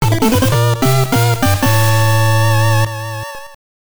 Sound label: Period Clear; Blaster Master Boy; gb